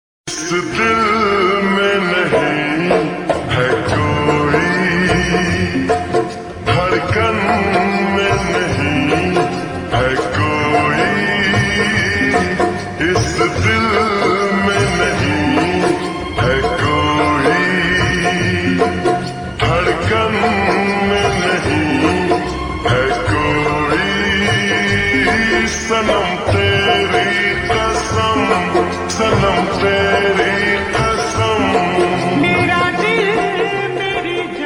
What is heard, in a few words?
Ringtone slowed and reverb